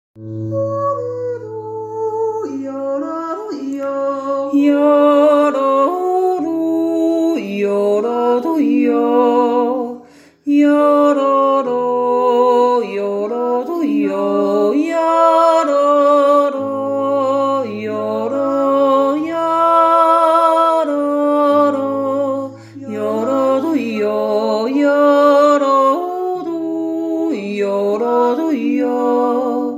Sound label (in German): bergchilbi jutz